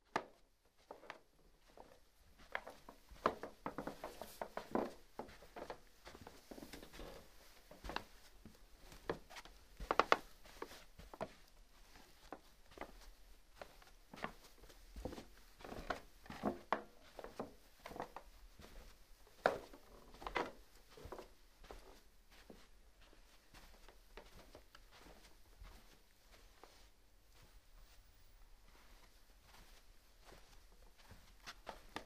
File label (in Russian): slaboe postukivanie